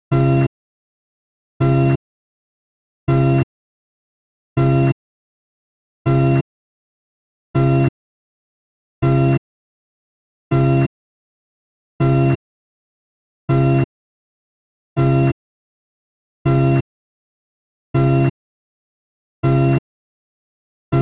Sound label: OCEAN ELECTRO